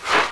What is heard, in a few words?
crossbow miss; Odyssey